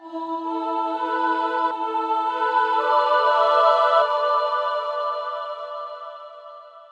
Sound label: Choir, Vocals; Combi Choir